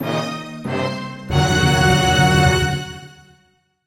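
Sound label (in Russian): дун дун дууууун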